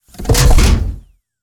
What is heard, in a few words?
footstep mech mega, Robotic Lifeforms; SoundMorph